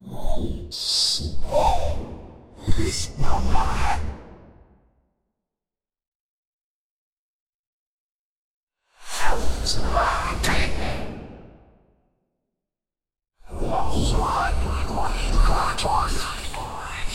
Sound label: a dark wizard conjuring a, fantasy